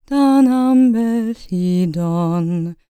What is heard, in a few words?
CELTIC, Vocal Planet World Voices; ILIO; Vocal Planet VOL-5